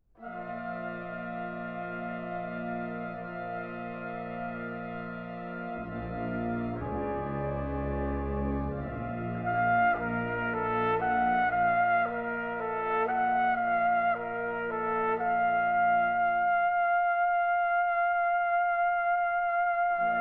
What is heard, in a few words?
Concerto for Trumpet Brass Band; Concerto No. 3